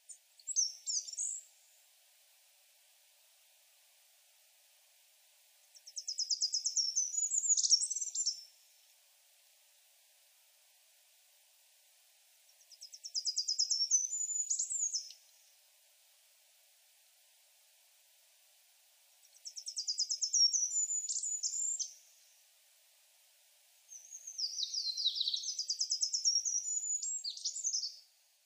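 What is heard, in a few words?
Goldcrest, furano; koe; kikuitadaki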